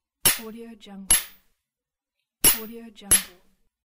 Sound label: hammer hits